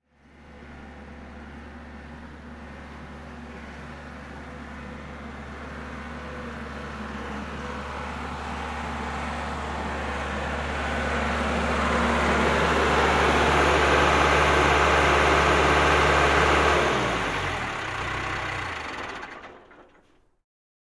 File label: Construction